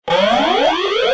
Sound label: gamble step; games; poker; American Poker The Legends II